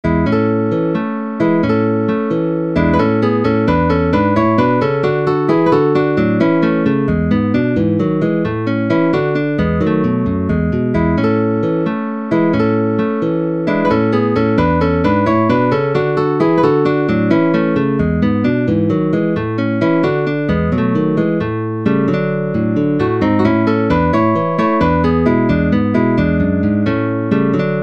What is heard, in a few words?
Guitar Solo in A Major